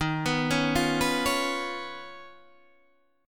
D sharp 7th Suspended 2nd Sharp 5th Guitar Open D

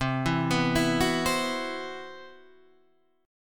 C Major 7th Flat 5th, Guitar DADGAD, chord

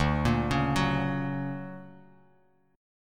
D Augmented Major 7th D down Bass Standard; D+M7 arpeggiated; JGuitar

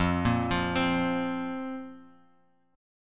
F Suspended 4th F, Bass Standard; Fsus4 arpeggiated; 13,13,15,17; JGuitar

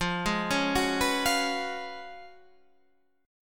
Db 11th F, guitar

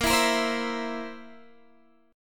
Bb Suspended 2nd Flat 5th, Guitar, Drop D

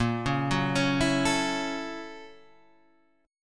F sharp 7th Sharp 9th, A sharp

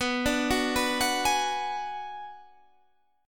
Ab Minor 7th Flat 5th B

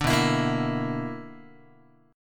B-Minor Major 7th Double Flat 5th, Guitar; BmM7bb5 strummed; JGuitar

